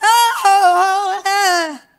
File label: "oh yeah" SUNG